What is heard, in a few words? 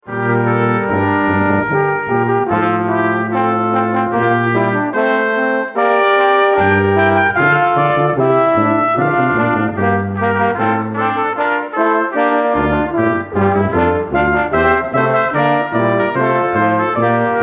Canzone